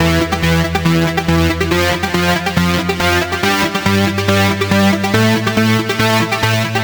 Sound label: NRG Arp, trance